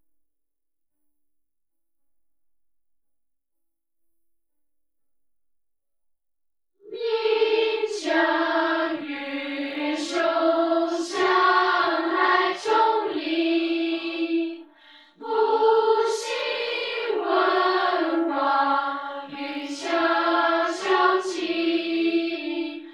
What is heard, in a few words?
vocals; 閩僑校歌